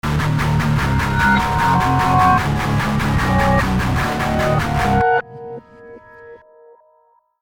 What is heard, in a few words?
Jingle Ambush Jingle; The Binding of Isaac Rebirth Afterbirth